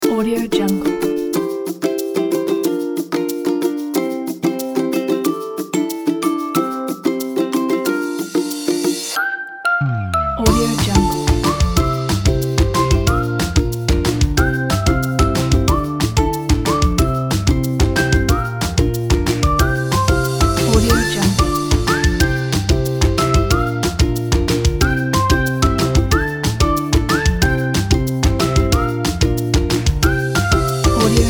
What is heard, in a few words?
brave child